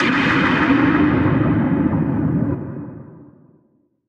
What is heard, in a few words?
NOIS; XXL 800 Production Effects